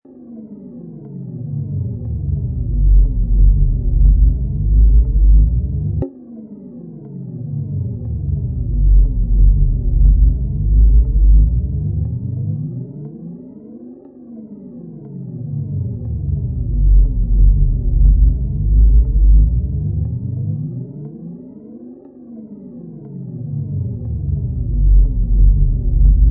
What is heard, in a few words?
Roller Coaster Bass, Deep and creepy evil bass sound; LOW FREQUENCIES; Deep Tone